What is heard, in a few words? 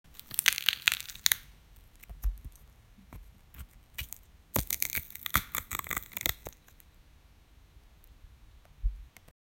crunch bite sound small